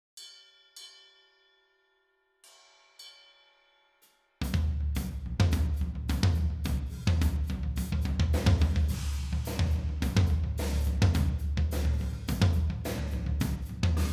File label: toms; AugustRain